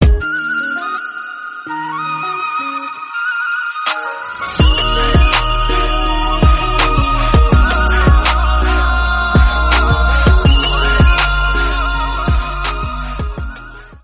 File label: Instrumental Flute